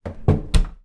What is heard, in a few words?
household door cupboard built in close kicthen utility